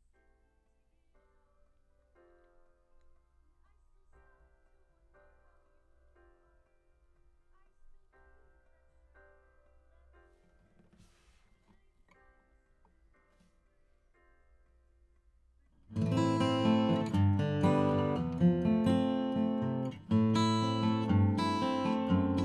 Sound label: AC GTR